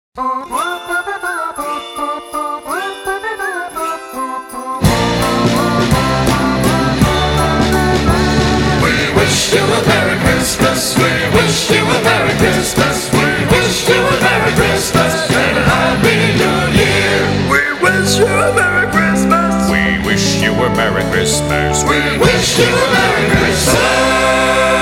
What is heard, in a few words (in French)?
Voix de personnages Pub chantée et sifflée; Merry Xmass